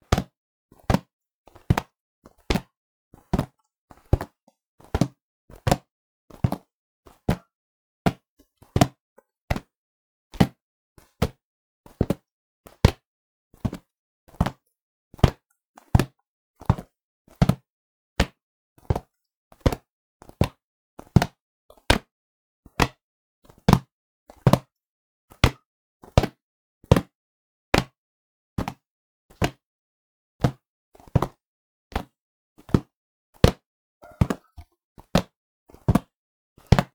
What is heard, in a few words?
volleyball ball grab; human; NS Studios - ultra sound collection